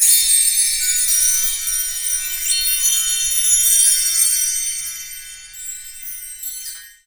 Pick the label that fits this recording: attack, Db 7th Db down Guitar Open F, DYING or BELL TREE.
BELL TREE